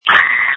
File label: screams